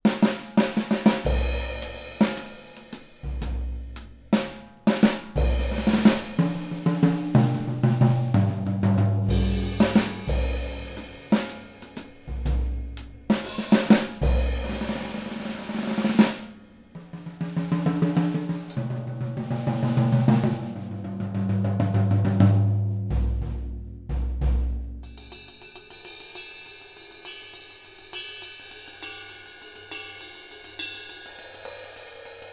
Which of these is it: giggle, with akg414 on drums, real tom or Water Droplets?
with akg414 on drums